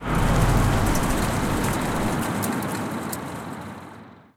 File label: car; Steven Universe - Beach City Drifters